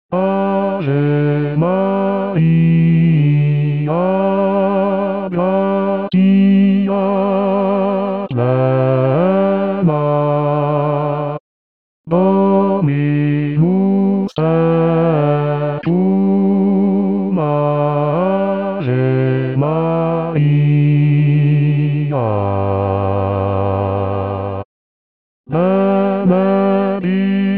Ave Maria Bas; Arcadelt; christmas 2024

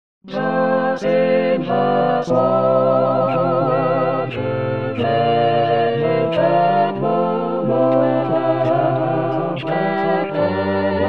Silver Swan All

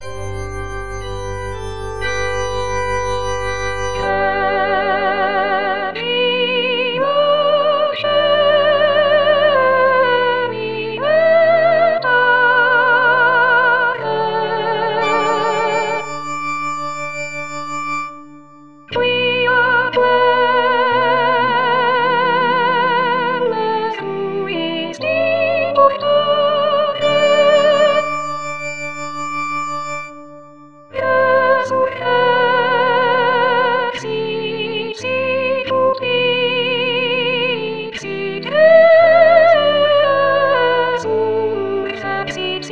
Latin Sop; Easter Hymn; Mascagni

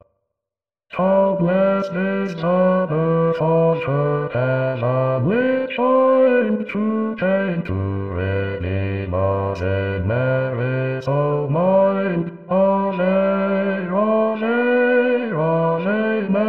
A maiden most gentle Bas1; Xmas; Xmas 2019

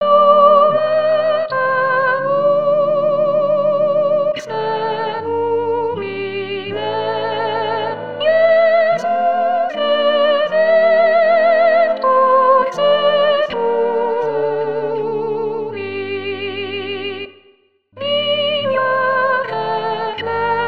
O nata lux, Sop; Tallis

